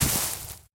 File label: brush step; Golden Crayon Sounds